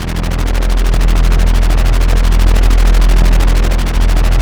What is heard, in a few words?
HELICOPTER, OBI FX; Zero-G Ambient 1